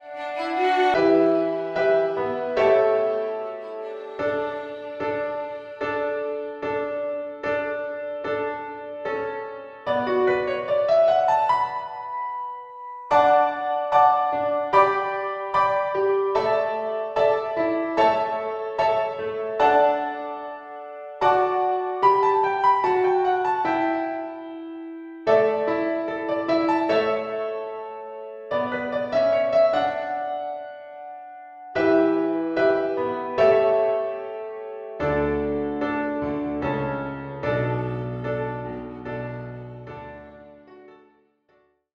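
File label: solo audition accompaniment track; onevoice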